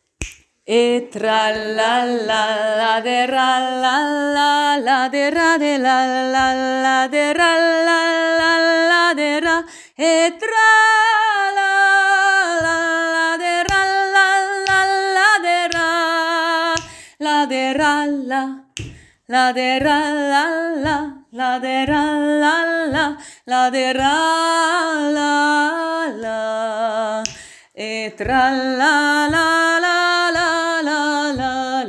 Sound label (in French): Tralala chœur